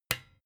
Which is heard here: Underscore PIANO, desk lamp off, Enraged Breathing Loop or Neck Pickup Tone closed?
desk lamp off